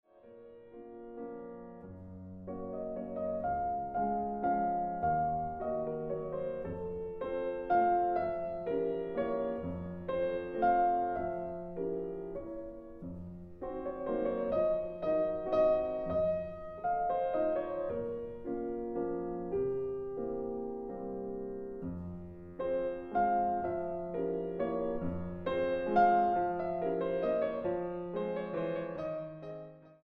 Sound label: Piano Solo; f Moll Ballade 4